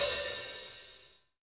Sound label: HH HH, HH Real